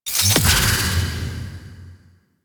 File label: Stealth Boost; Warframe; Colonist Rescue